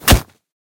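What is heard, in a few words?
sudden aimed hit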